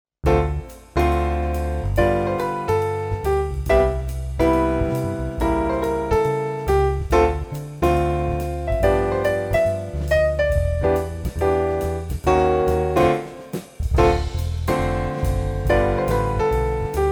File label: Jazz ' N Swing